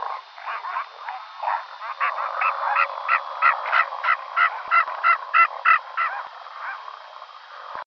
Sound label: Great Skua